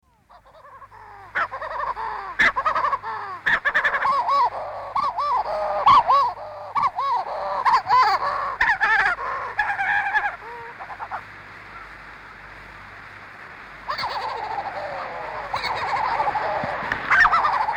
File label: huttons shearwater, bird song; conservation, native animals, birds, bird song